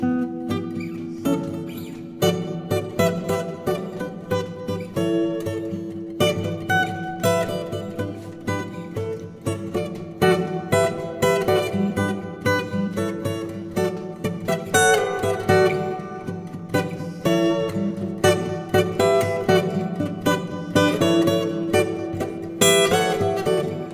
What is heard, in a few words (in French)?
Rythmique solo